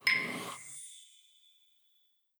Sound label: mini monologue start